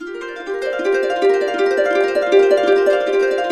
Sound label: F, Harps; Complete Harps; USB Soundscan vol.62; AKAI